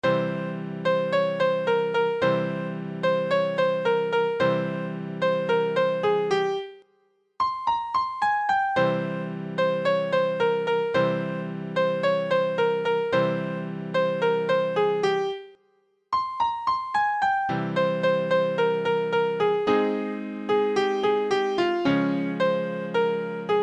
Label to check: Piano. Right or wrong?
Right